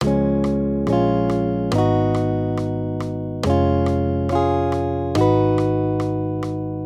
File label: maj triads